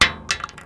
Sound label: metal; Mafie 1